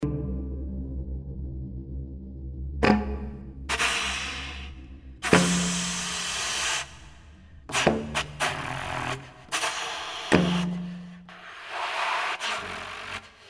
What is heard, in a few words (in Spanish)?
electronica o saxofon